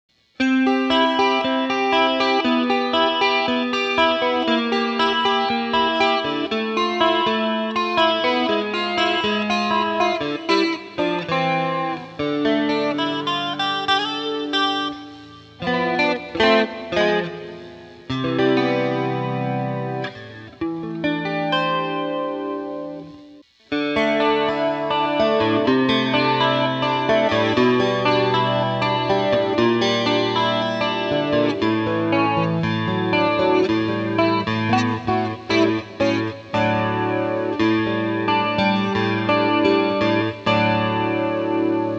Guitar Flanger, AXE FX 3

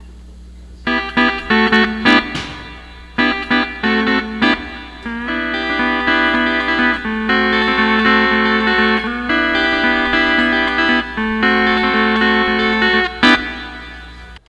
12 String Lap

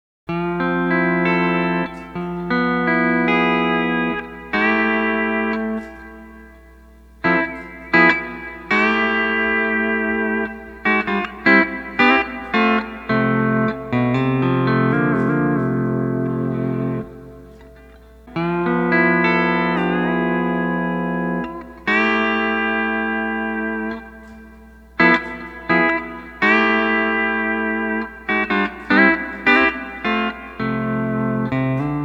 Add 9 Steel C Alternate Pedals

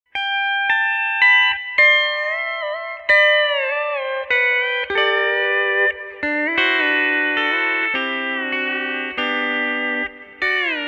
Vibra CB, AXE FX 3

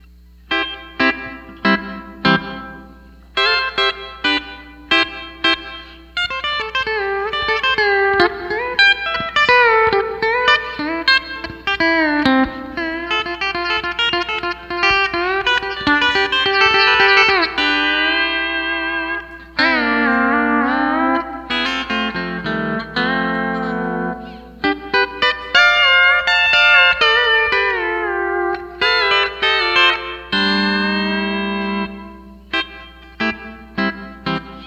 Room; Hall Of Fame

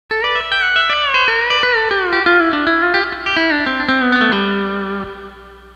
Pick Block

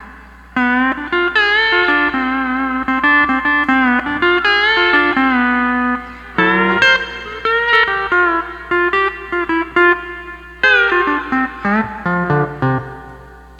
12 String Lap

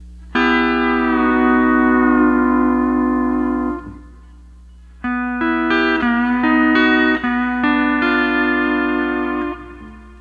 12 String Lap

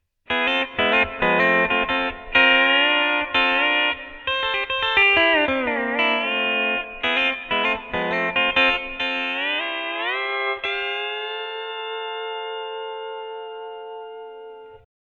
Deluxe BC, AXE FX 3

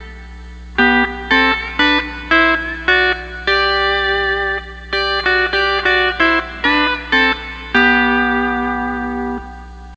12 String Lap

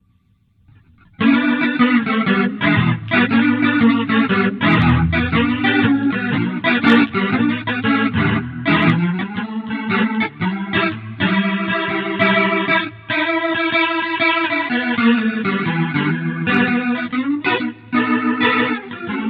Crunch Rotary, Boss GT-8

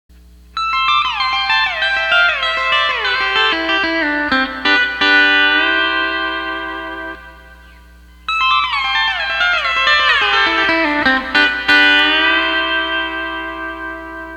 Fast Lick

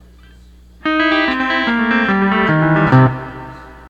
12 String Lap

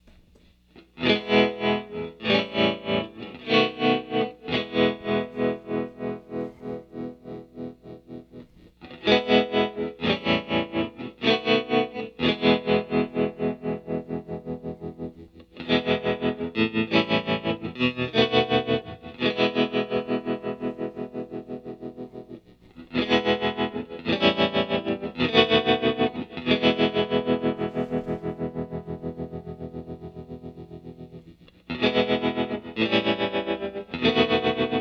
Tremolo, Gibson GA-40 Amp

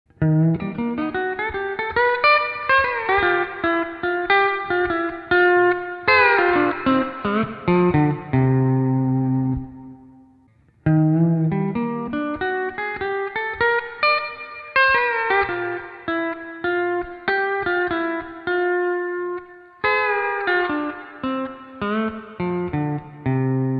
C6 Riff Transcribed to E9th

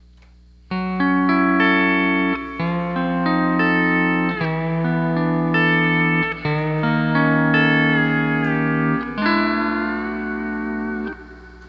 Night Life E9th

